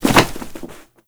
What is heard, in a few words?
Hit Ledge; Foley; Warframe; Tenno